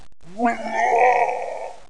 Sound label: DROWN